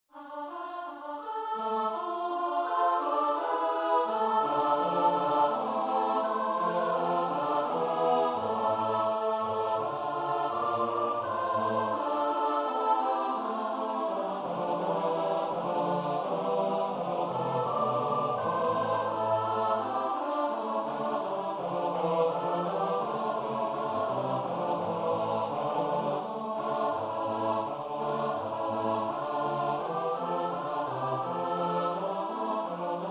Psalm 96 Chantez à Dieu Chanson nouvelle (S-A-T-B); Sweelinck (Jan Pieterszoon); Pseaume 96